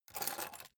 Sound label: key ring large grab table soft